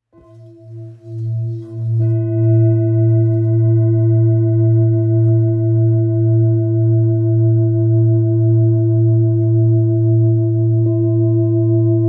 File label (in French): Bol grave la diese 1.87kg 7 chakras